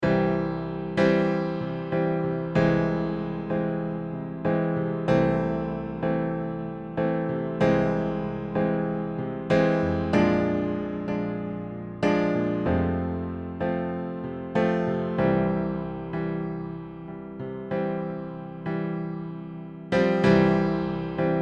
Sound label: Chord Progression